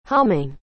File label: humming